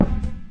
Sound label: yogaball double, Big Rubber Yoga Ball